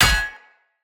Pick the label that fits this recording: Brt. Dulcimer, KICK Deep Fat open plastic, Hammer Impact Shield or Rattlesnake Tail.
Hammer Impact Shield